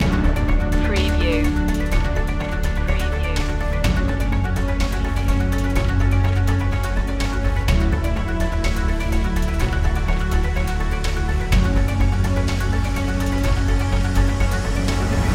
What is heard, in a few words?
machine land loop